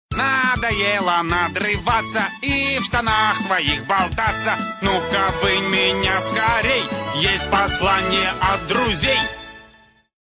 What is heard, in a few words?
SMS song